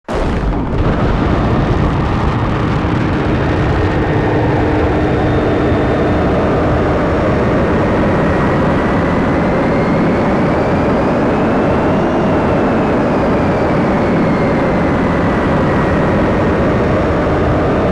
small ship takeoff; Titanfall 2

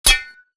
imp bullet light ballistic titan; Titanfall 2

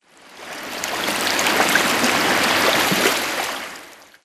swampland water lapping; Titanfall 2

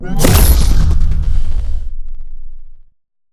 bt embark casual left grab; Titanfall 2

